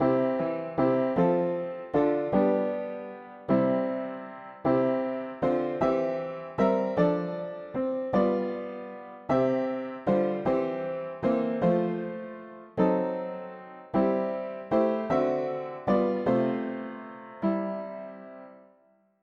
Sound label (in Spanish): mono piano; hymns; Himnario pequeno 2Pte